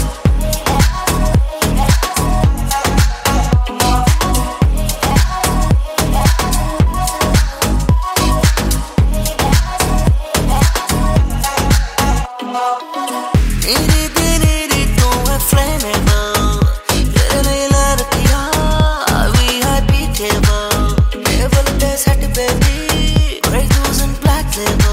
ringtone, bollywood ringtones

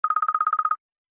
hillside ringtone iphone sms

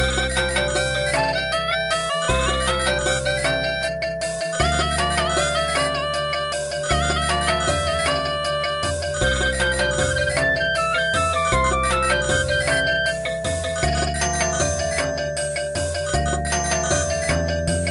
Nokia sad song ringtone; puppeteer

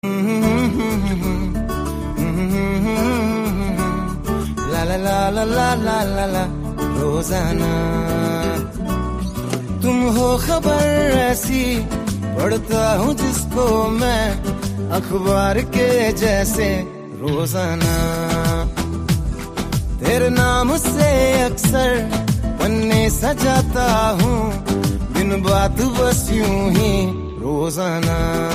hindi ringtone, bollywood

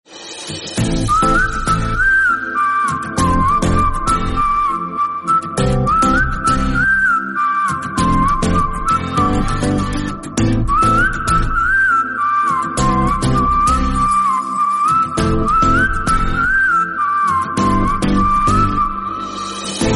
lovely soft whistle music tone ringtone

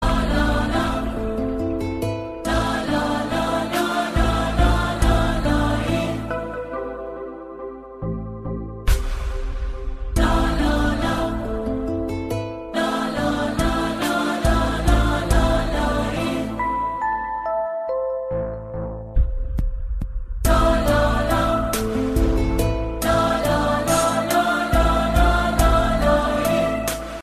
best classic music ringtone

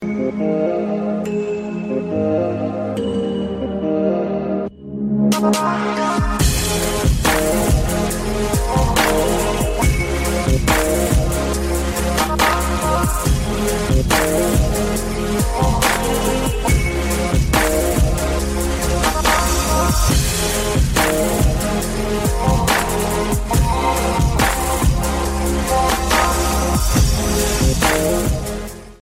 nokia tune ringtone

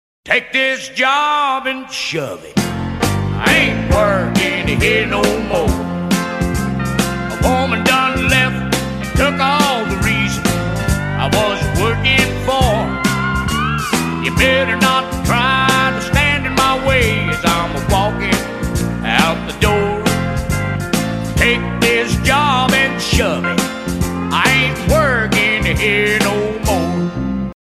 English song ringtone

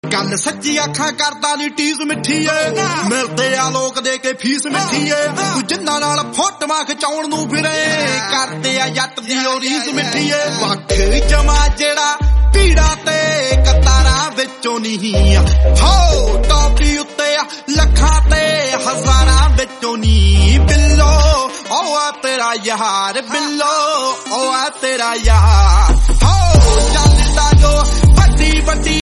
punjabi song tone ringtone

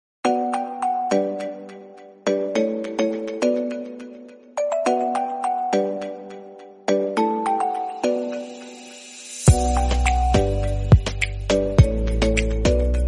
instrumental free nice music tone ringtone

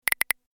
light click sound background music sms tone

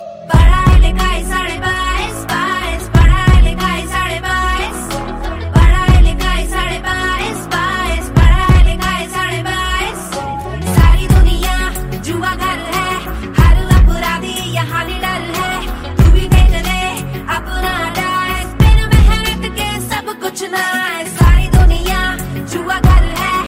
hindi ringtone; bollywood ringtones

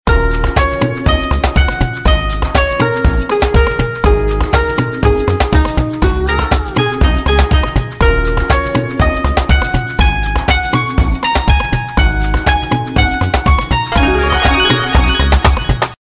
galaxy festival ringtone

